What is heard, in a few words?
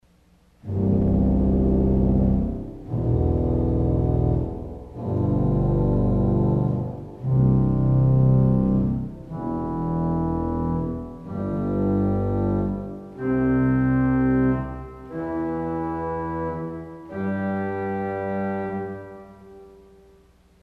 Voix del Abime Arpeg with other stops, organ; CulverAcademy